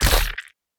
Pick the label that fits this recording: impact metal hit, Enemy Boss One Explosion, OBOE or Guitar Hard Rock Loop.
Enemy Boss One Explosion